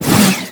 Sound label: Spin Whoosh, Weapons; Warframe; Dual Zoren Prime